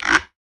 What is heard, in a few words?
Bin Scrape, household rhythm samples Hits